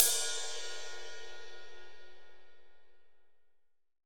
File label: ZIL 22, JAZZ RIDE; AKAI S-Series CD-ROM Sound Library VOL-3